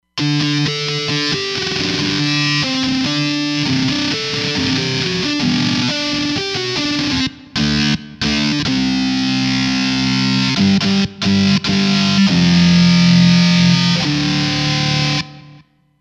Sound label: Loud Gtr; Roland Fantom XR